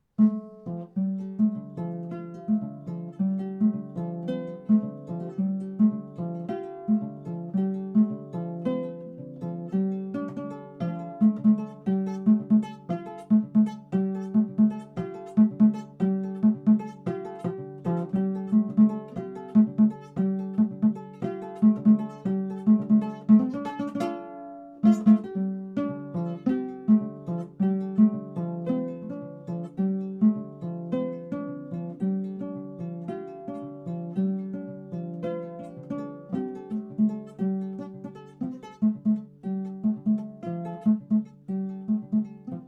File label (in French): ngoni 10 cordes